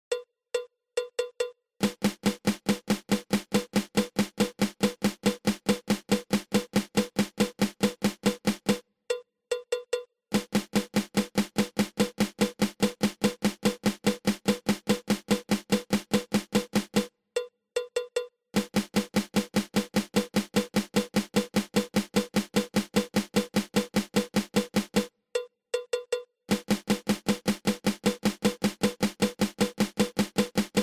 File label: Flam